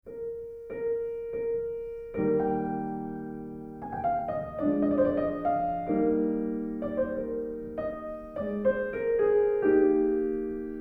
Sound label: Trio; Mozart; Bb; Mvt 1